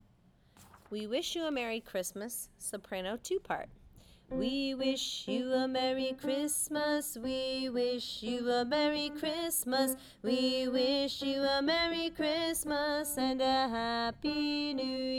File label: We Wish Sop